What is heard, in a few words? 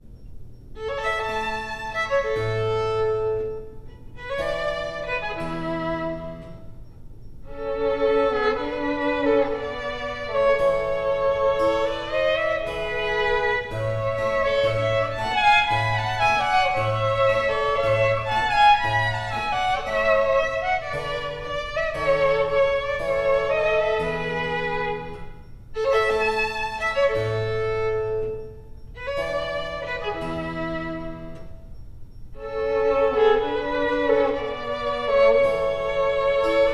Menuet; Menuet V